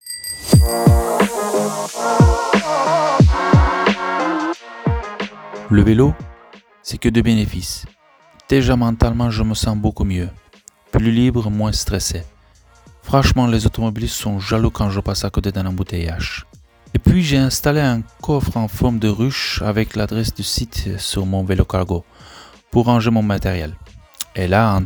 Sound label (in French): jingle